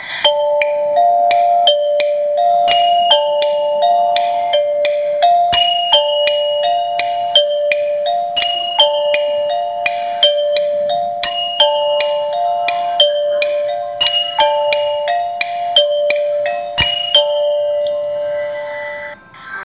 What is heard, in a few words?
Music Sad